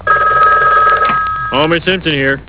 phone

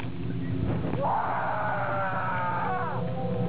Scream

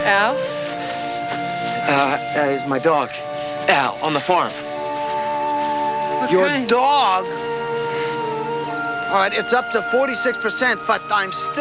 dog

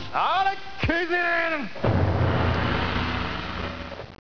gong

